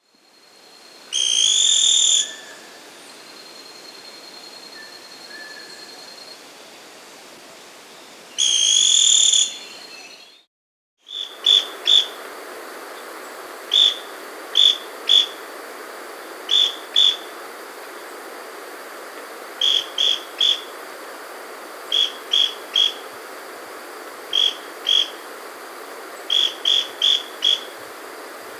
Scale-Crested Pygmy-Tyrant (Lophotriccus Pileatus), Andean Birds; S. America